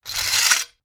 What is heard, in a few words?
medieval sabre draw from sheath fast; still north media